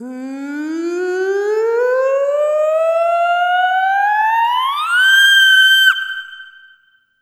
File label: Arrythmic Atonal; Sonic Foundry (Sony Creative Software); ILONA